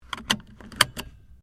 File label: Car Toyota Corolla seatbelt clip; 2009 Toyota Corolla hatchback